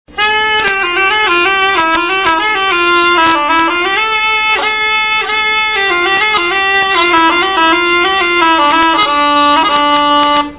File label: shawm; medshawm